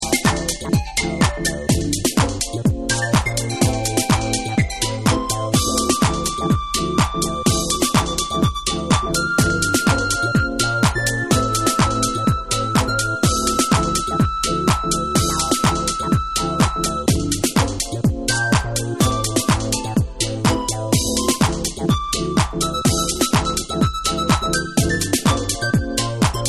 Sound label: FLUTE